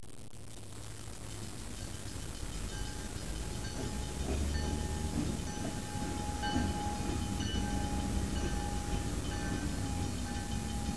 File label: train